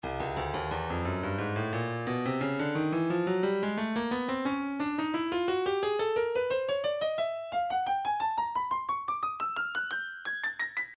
breakbeat midi files 085 089 bpm; Stylus; 088 Barrio A

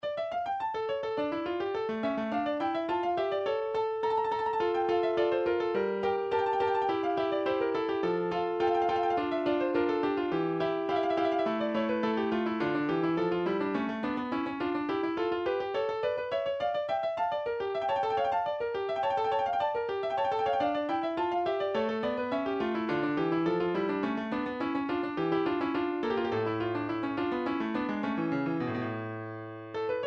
piano

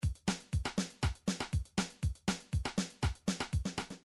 midi drums

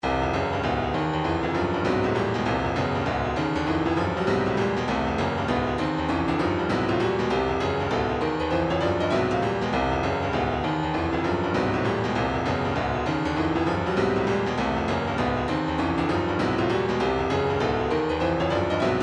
retro funk, midi; koolest

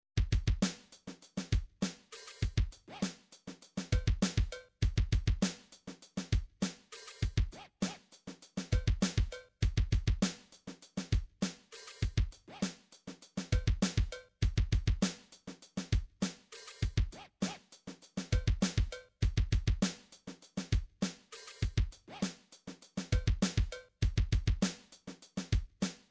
midi drums rap

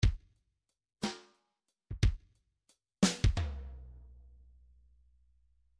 midi file fills 3 4 fill; Bdf Midi File Fills

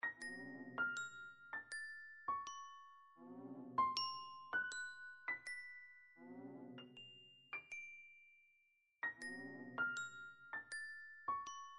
secret area; sequenced; nintendo; metroid

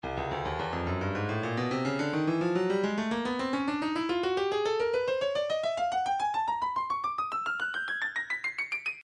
breakbeat midi, 107 bpm; zolar plexus a; Stylus Breakbeat Midi Files

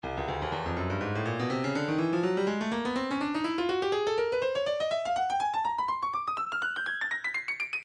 Percussion Bongos, midi; Stylus

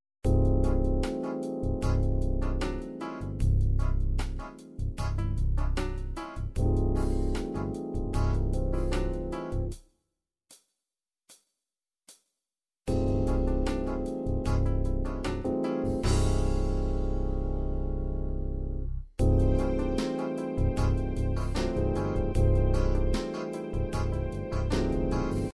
ballad

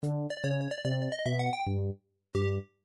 game over; nintendo bubble bobble

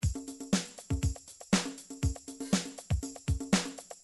midi drums

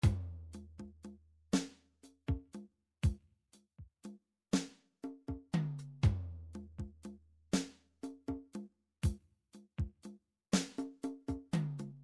drums

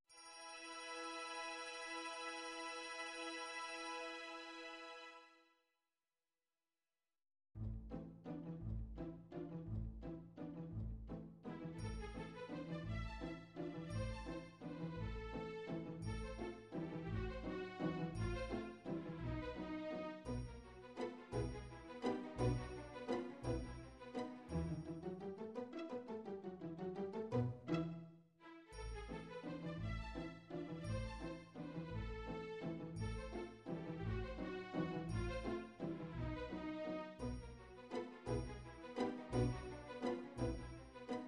classical, midi

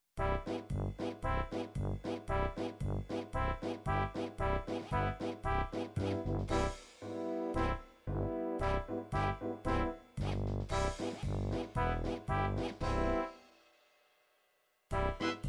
polka, midi

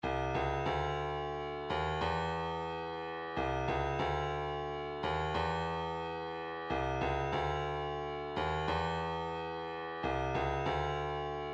144 kick

